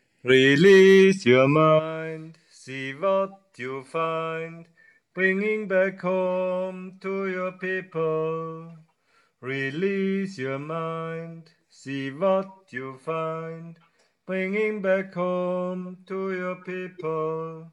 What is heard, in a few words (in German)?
Feuerlauf Mantra